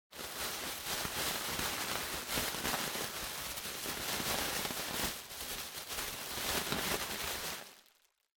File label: Packaging Air Cushion (Rustle); 梱包材; 梱包用エアークッション; ガサガサ